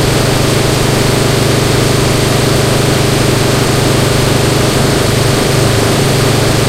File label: sherman m4a1 engine int rpm 66 load, tanks; engines; War Thunder